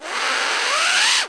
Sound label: wheeze, deer; deer rattling; practical bowhunter